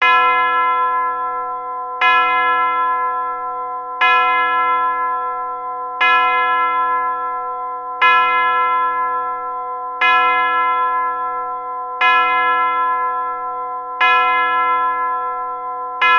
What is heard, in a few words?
Thirteen Chimes